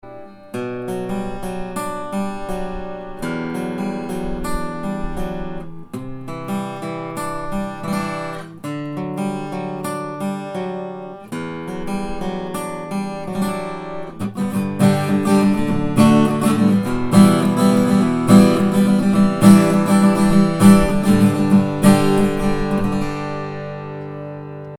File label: matin 000 42